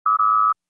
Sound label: computer beep